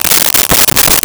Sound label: Write on Paper with Pencil; Office Supplies